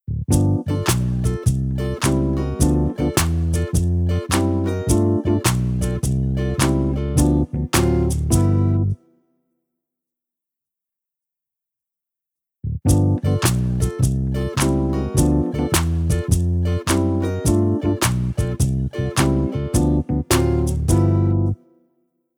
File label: Its Okay Instrumental